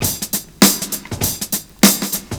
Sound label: 70s Breakbeats; USB Soundscan vol.46; AKAI